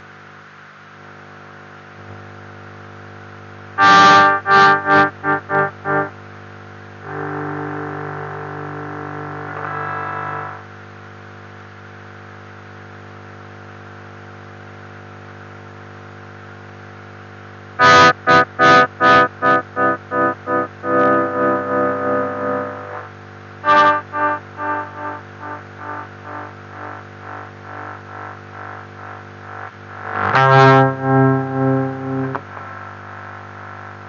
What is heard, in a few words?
guitar